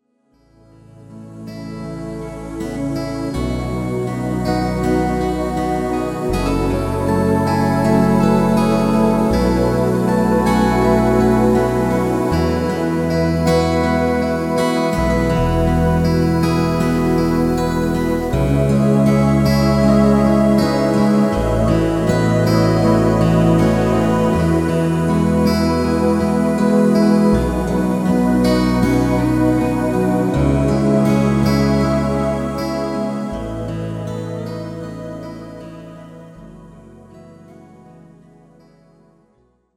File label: Mystic river